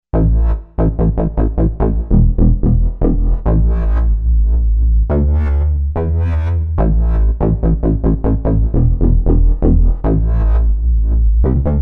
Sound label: Doppler Bass